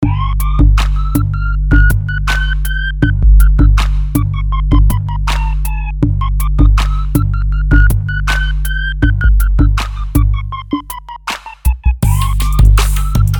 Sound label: trap; songkits; Mixcraft